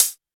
dry pedal hi hat c key; hat samples

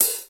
Dry Hi-Hat C Key, Loudest frequency: 9516Hz; hat samples

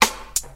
dirty steel snare drum sound a sharp key; Duration 0:01; Channels Mono

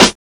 old school bright snare drum sound c key

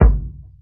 subby kickdrum sound g sharp key; kick drum samples

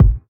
fluffy kick drum single hit g sharp key

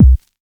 natural kickdrum sound c key

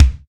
short kick drum one shot C key

